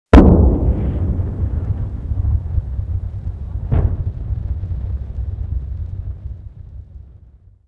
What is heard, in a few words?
Artillery; Red Orchestra 41-45